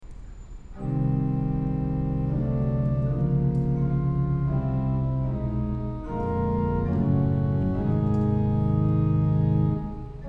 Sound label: Organ